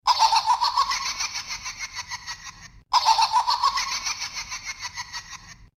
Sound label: box laugh; Jigsaw